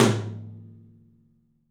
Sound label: TOM, 3D Drums; 3D Sound